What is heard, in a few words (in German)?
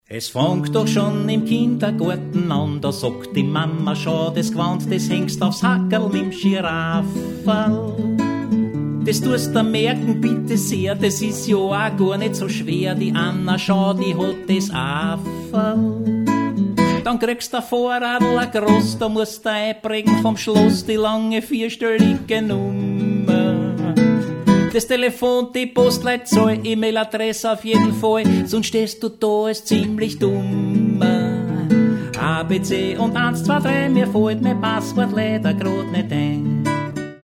Passwort-Blues